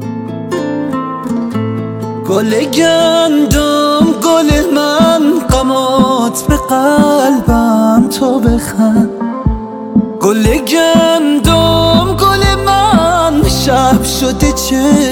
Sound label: solo